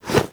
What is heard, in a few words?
Holster Weap, Foley; Warframe; Characters; Tenno